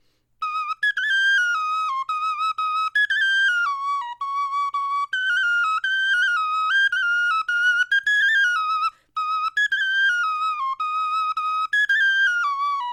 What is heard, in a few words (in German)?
melodie